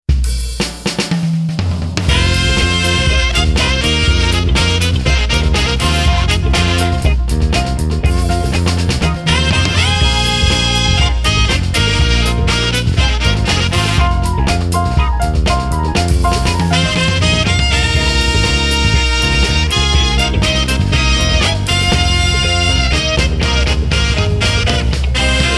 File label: jazz funk